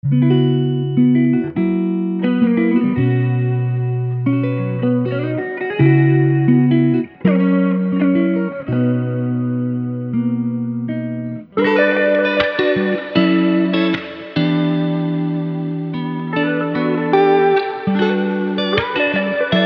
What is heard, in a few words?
RB Soulful Guitar Licks